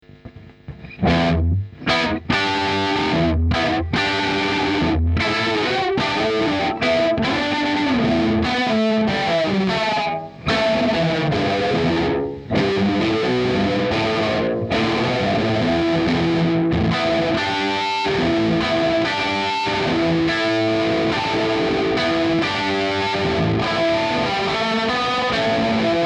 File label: trr dist playing